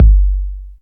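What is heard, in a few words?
808 Kick; Quasimidi Rave-o-lution 309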